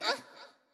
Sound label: Wimp Hurt; Wimp Hurt New